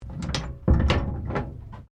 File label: Train Diesel X Class 1951 Cabin Door Close; Tasmanian; TGR; Bellarine Tourist railway vehicles; Trains